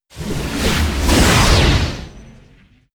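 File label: ice guardian attack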